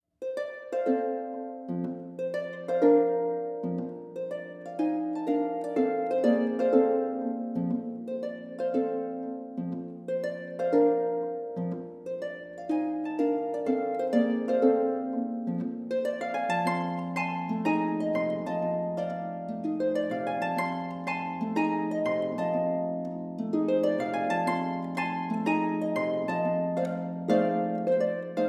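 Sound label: New Blues, auf Harfe & Hackbrett; mit Sopran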